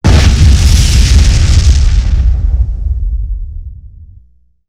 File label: Explosion Single Large; Sony Pictures Sound Effects Series